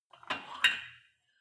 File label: weight rack pull out rattle